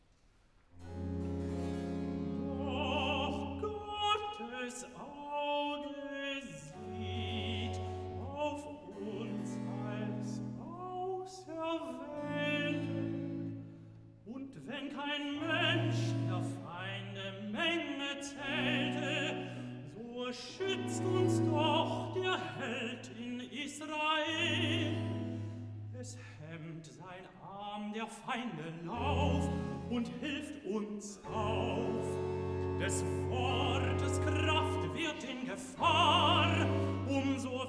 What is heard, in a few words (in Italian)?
Cantata, Recitativo (Tenor); BWV 90 'Es reißet euch ein schrecklich Ende'; IV. Recitativo (Tenor) 'Doch Gottes Auge; Bach; Potsdam; 19th Sun After Trinity